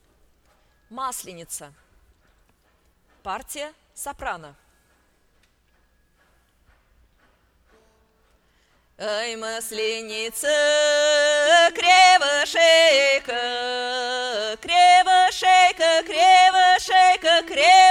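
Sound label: masl khor soprano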